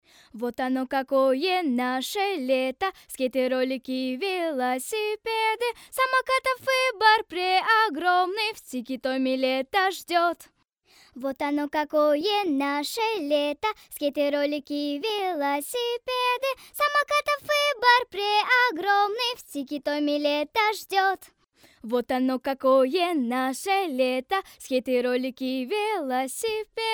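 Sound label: vocal; Tiki Tomi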